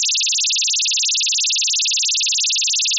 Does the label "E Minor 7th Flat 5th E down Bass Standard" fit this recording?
No